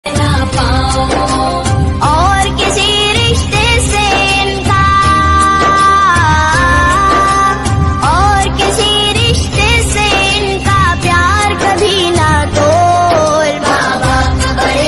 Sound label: islamic ringtone; mom dad ringtone